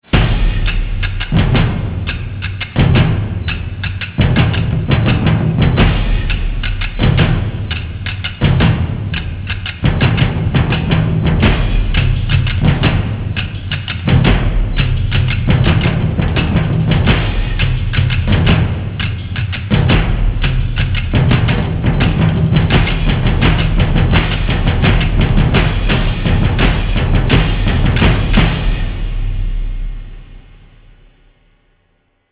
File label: War Drums